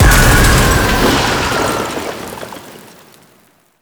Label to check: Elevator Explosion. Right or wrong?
Right